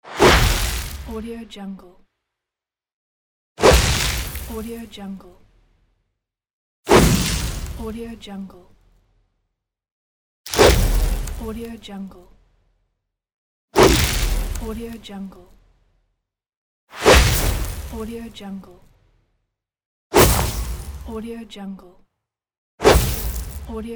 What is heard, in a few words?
ice swish